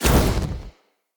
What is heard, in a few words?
Casting Device Cast Right; Weapons; Player; Fenn; Casting Devices